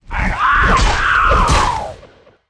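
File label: birdman attack, monster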